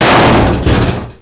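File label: metal break; svencoop; blackops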